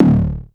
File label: DTOM, Klone Dual-Percussion-Synthesiser; Drum Machines